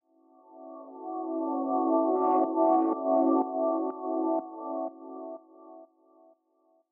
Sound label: Neo Sweep